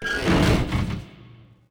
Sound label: swing strain metal